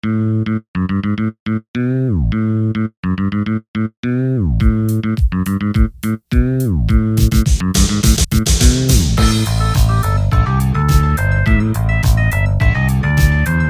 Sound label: Funky Song; Worm Paint